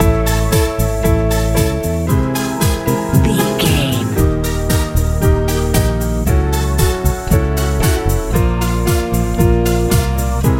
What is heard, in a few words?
modern pop